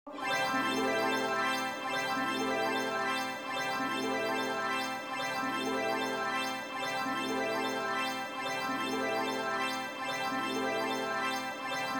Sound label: ambient